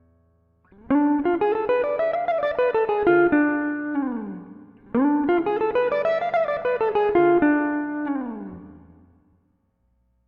Ddim Exotic, guitar

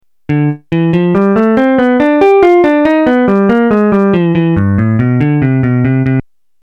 II V I lick, guitar

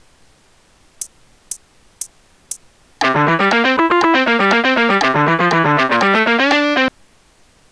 C Mixolydian Run, guitar

